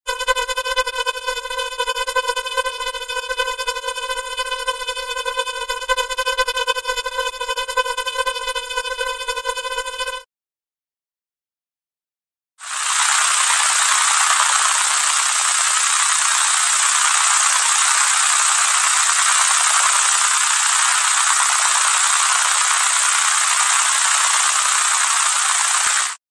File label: space alien radio signal; radio signals